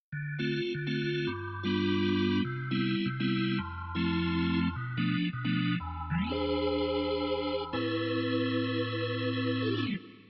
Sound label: Cool Rotary Organ